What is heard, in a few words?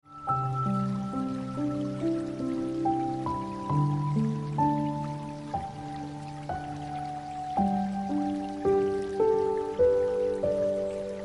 Ringtone Piano Music